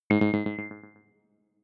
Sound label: click code room heavy, signale